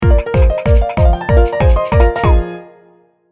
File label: speedup